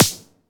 Steel Snare Drum Sound E Key, steel snare drum tuned to the E note; snare drum samples